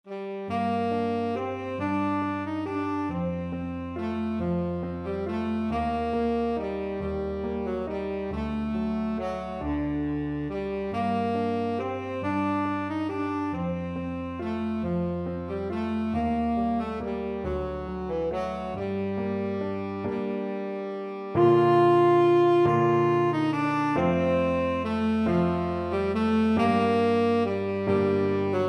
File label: tenor saxophone